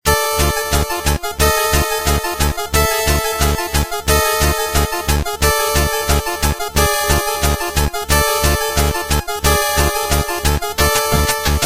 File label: music boss; Dash Quest; android